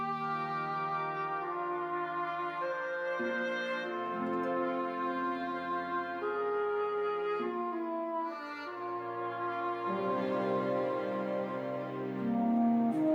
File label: Music Only; Electa Quinney